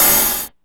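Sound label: Open Hat, DIGITAL KIT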